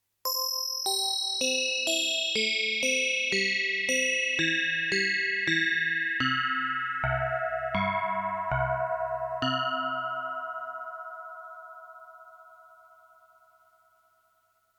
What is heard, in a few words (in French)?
FM DX7 Tubulure; ce son possède des harmoniques finaux proches de ceux d'un tube d'acier chromé. Il fait aussi penser aux sons de PPG Wave-2 (1981)